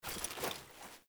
Foley Action Default Soul Steal Combined, Foley Default Clothing; Characters; Player